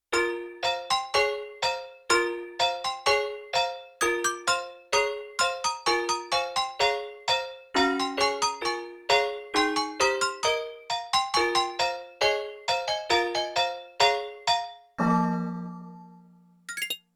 Handbell Ethnic; Wind Chime & Handbell

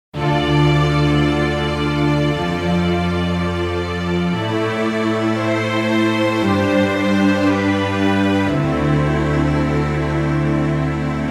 Soarin String, Roland JV-880; Factory Patches

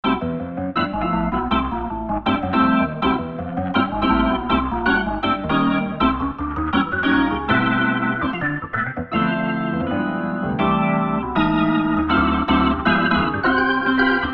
Roland SRX-07 Gospel B; Roland SRX-07 Ultimate Keys

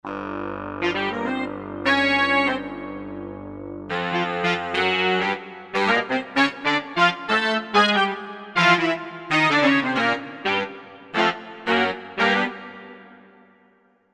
Korg MSC-11S Brass; 4PceSaxion

